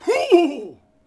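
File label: Longbowman Die Vox; Civ4 warlords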